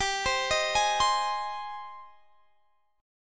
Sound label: G sharp Major, guitar; G#/G; arpeggiated